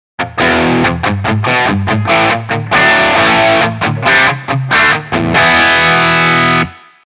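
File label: guitar Screamer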